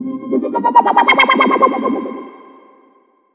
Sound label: ORCHFX, orchestra, Asian Traditions; USB Soundscan vol.60; AKAI